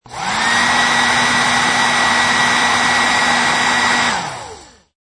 Heat Gun Light Long, Hot air heat gun, long shot, low power (first level); Heat Gun Sound Effects; Machines; Lynne Music